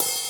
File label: Hi-hat open